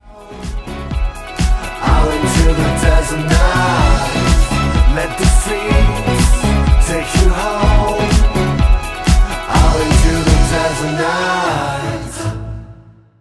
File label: Orchestral